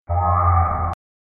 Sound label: slowed reverb